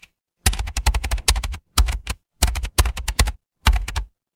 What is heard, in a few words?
office keyboard